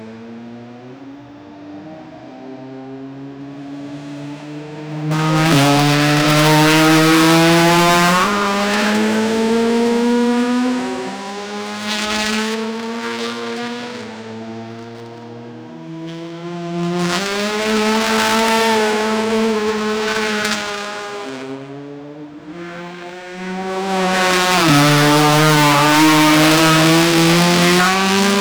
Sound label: a race motorbike with v4; akrapovic